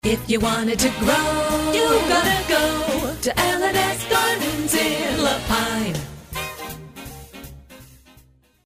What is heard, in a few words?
Jingle Out